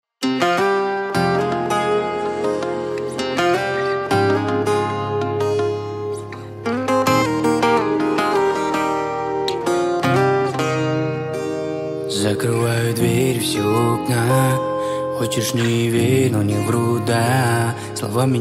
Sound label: acoustic version